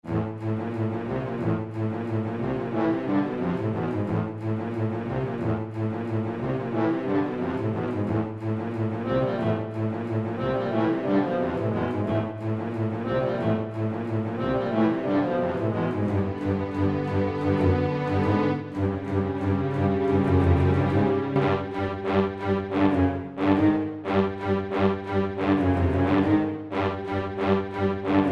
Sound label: Clashing Instruments